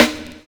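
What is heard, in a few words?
OLD GROOVE; Univers Sons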